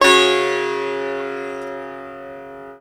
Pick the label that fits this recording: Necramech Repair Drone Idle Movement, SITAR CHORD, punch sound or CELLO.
SITAR CHORD